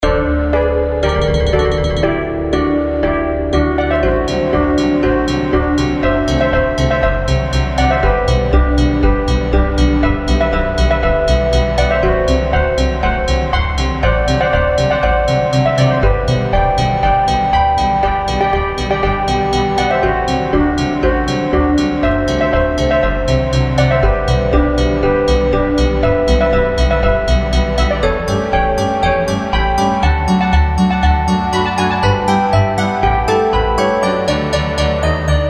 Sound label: Thunder & Lightening March